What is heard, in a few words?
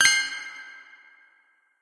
coin; GeoTombs